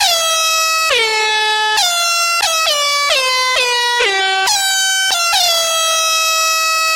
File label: saddest song airhorn